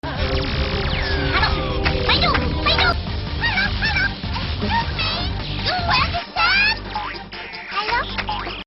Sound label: clock; Haro